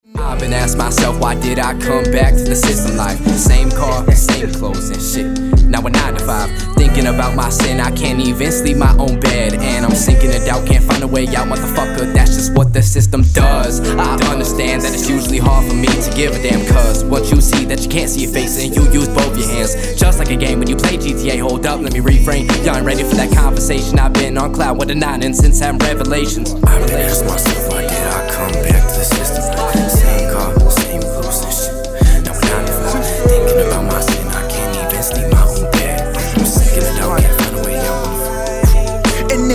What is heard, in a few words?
HIP HOP